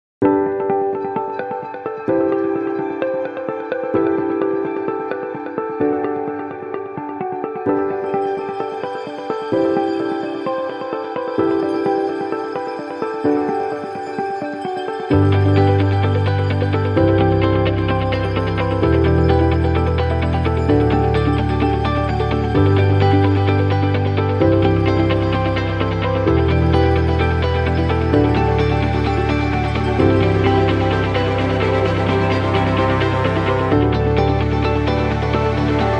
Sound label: Motivational Inspiring Corporate, corporate music; corporate music vol 15